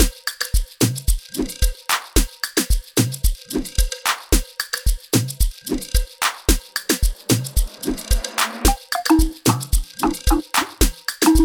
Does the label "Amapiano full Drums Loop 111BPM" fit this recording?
Yes